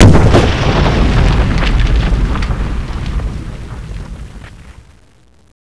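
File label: explosion; svencoop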